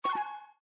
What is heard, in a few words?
Windows User Account Control; abbottabad compound